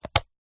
household mains power plug pull out remove from extension block